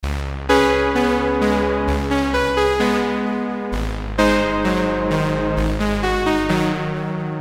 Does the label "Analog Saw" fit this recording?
Yes